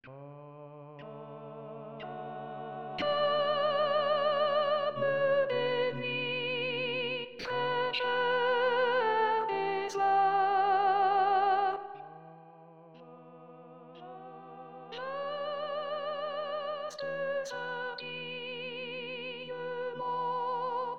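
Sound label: soprano; calme des nuits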